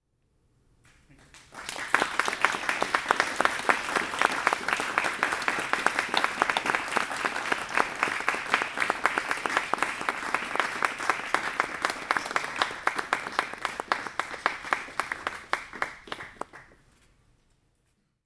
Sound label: Intimate Applause